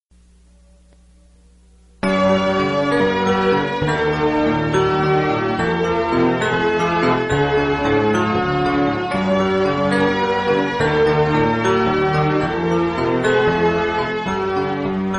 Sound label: Instrumental